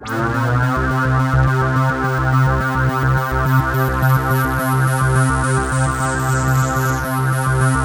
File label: C3, SynthLine